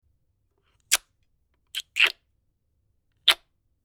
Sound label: Kiss